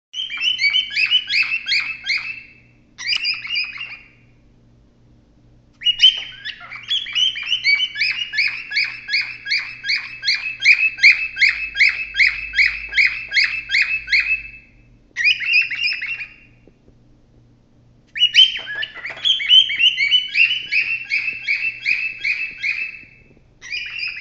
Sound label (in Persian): صدای سوت زدن عروس هلندی نر